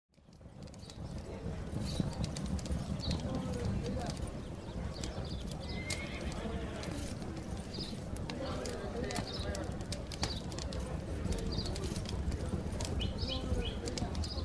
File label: Ambience; Age of Empires III; cinematics